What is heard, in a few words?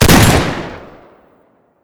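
shotgun double fire; weapons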